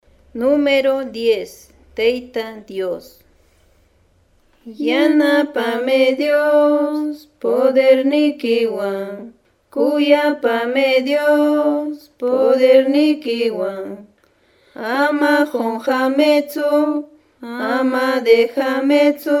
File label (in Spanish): cancion